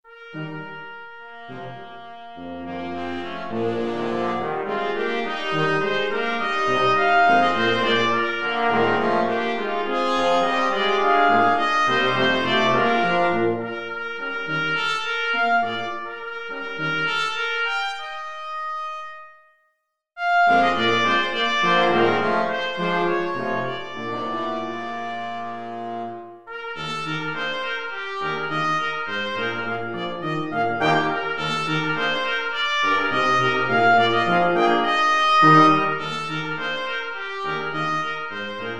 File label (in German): blechblasquintett